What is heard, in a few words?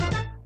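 fanfare end of ball, pinball; WILLIAMS; INDIANA JONES; Indiana Jones Original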